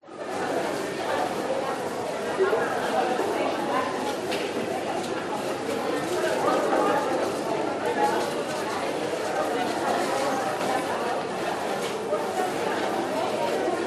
South Africa Store; Audio Hero

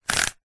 leisure puzzle cube single rotate; rubiks

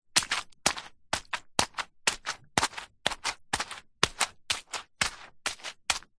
foley footsteps hard soggy wet grass mud

